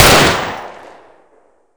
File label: p38 shoot, weapons